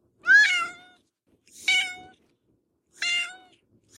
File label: cat meow; iphone ringtone